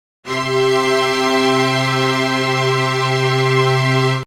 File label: strings sound